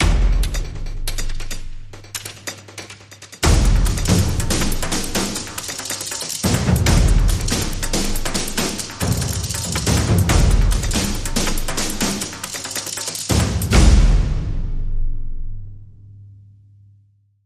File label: Tribal Thunder